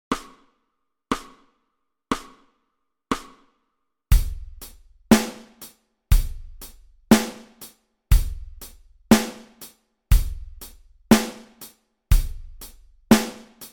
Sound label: 60 BPM Rock Beat 4 bars